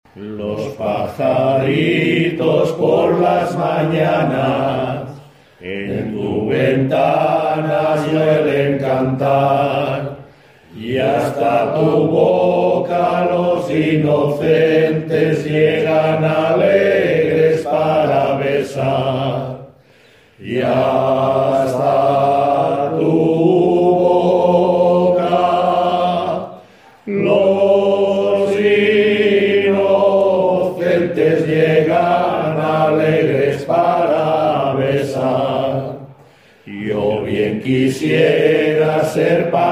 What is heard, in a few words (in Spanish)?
Pajaritos